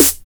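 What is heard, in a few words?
909 TEKNO SN, TR909 snare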